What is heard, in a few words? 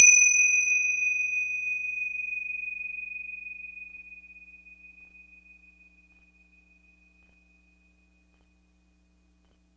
tinshaw; World Music